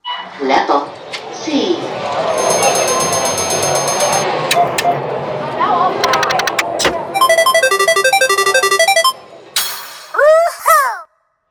Casino; Field and Foley; Casino Essentials

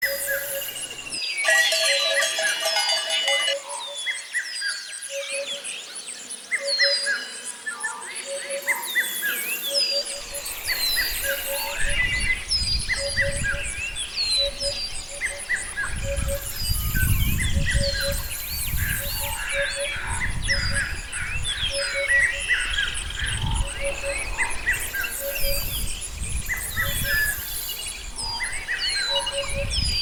Animals Life Sounds; Cloud Nine Records

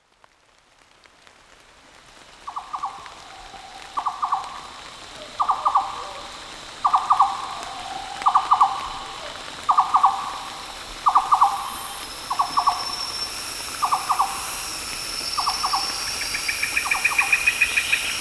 Borneo Rainforest; Pro Sound Effects

